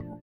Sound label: VIBRA; Spectrasonics; Metamorphosis